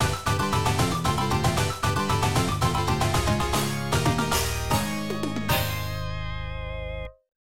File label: Round clear music; Ordyne; Namco System 2; Arcade